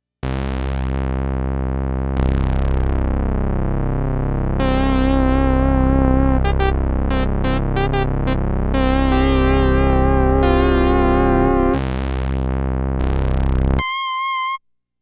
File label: Tuned Bees